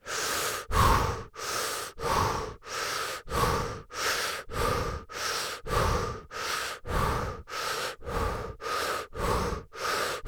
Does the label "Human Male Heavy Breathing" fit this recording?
Yes